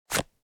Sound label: Wallet credit card sliding into slot; purse